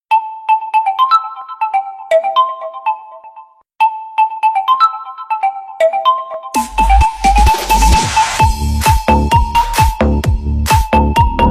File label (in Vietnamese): khong loi realme remix ringtone